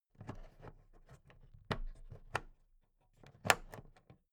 plastic clasp handle open soft

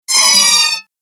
agressive motion

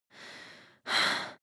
breath female aroused intense

